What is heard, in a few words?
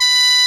SHEN A#3